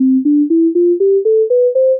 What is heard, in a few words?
melody scale, c major scale